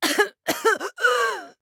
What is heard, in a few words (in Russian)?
Кашель; Симметра